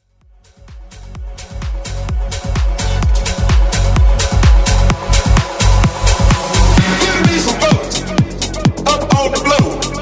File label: Charged Up